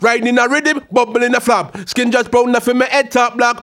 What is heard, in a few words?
RAGGA MUFF, B maj; Sound & Vision - Gigapack II